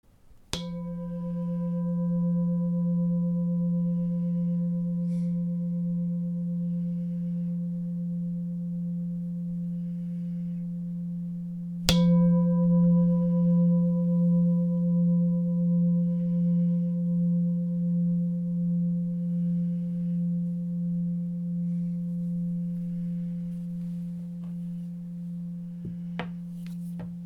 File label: chakra bowl